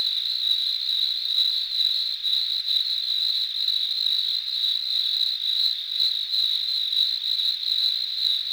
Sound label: crickets night; WAR